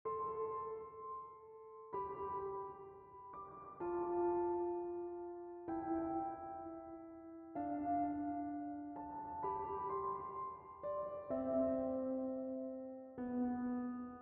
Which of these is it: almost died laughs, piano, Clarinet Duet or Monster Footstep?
piano